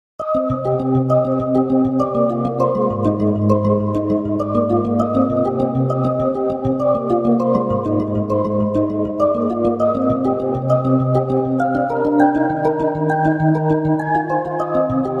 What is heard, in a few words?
CLEAR MALLET